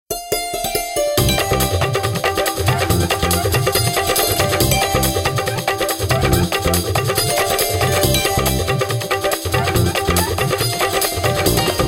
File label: Santoor Fusion, Nokia Tones; Windows Phone apps